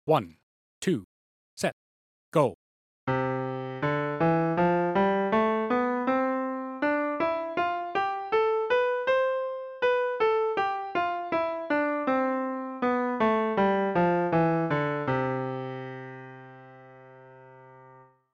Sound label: G 2 octave 80bpm, two octave scales